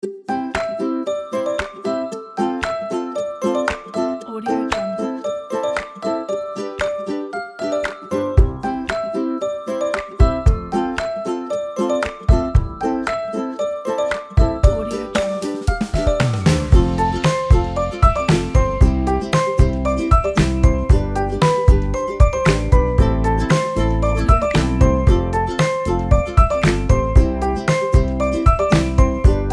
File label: happy mood; veaceslav-draganov